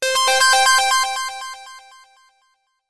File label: ALERT